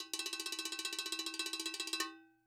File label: COW ROL, Percussion; Sampleheads; NYC Percussionworks; GJ PERC VOL1